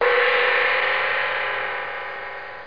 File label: gong